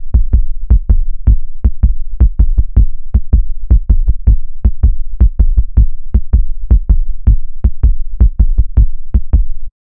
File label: heartbeat fast organic